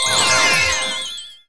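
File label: Skill Dispel Magic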